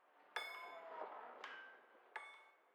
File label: amb building smithy; demacia rising